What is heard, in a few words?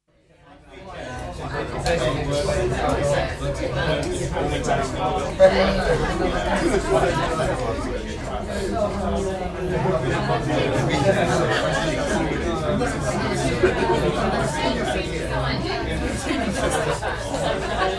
Cocktail Party, atmosphere at a small cocktail party; Bars And Club Sound Effects; BBC